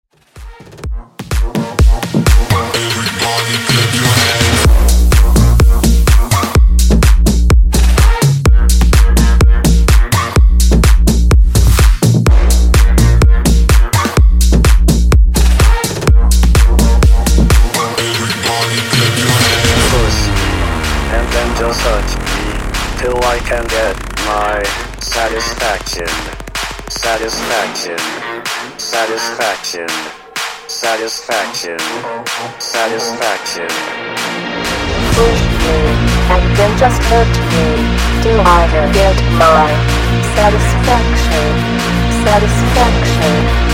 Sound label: Funk Mash Up 126 Bpm